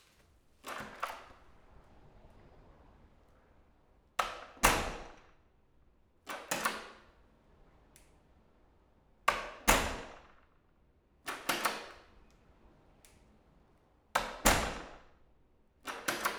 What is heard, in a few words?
Bathroom Door